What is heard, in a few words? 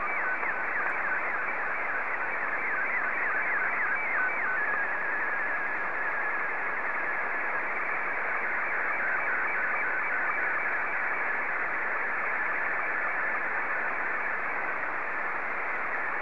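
slow-scan television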